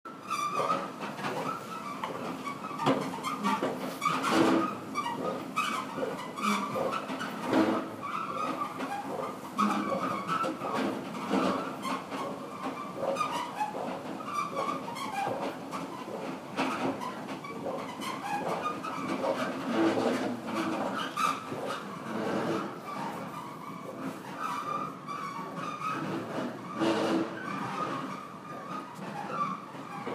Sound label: squeaky escalator at platform 3 stratford international station